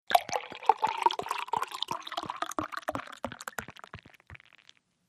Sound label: Beer Bottle Pour; eating & drinking